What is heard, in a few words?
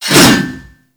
Axe Swing; Warframe; Weapons; Swings